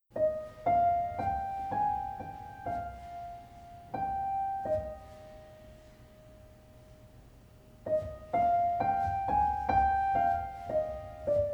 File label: Elephants (instrumental)